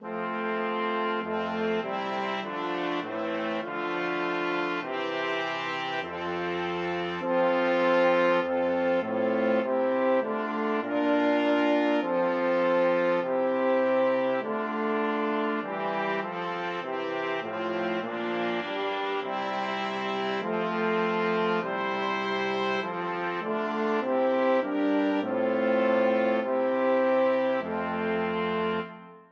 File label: BRASS,brass quartet; all people that on earth